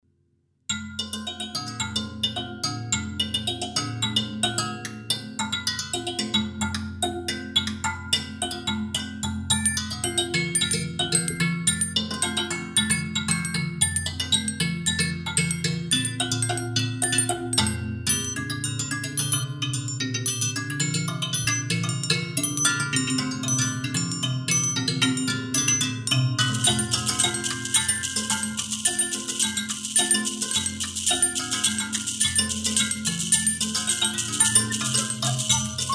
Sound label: Trod on tine